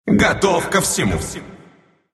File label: move pain, ru; dota2